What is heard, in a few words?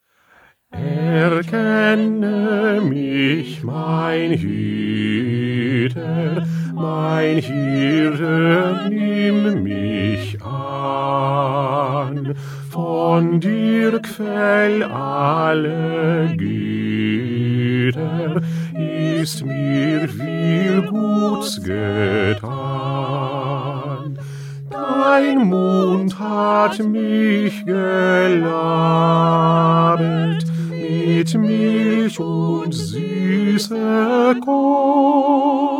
Bass; Bach; St Matthew Passion